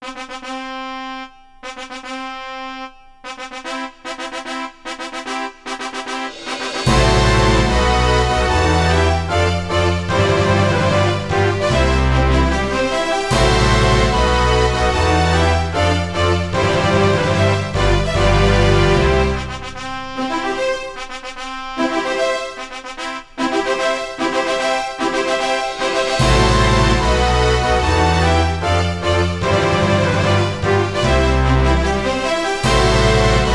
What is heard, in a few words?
Wedding March; samba de amigo original songs collection party party party original game soundtrack 2023